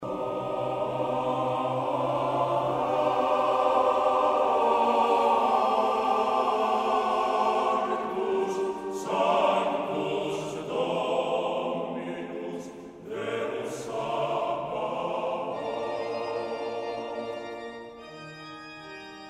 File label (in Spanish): voces graves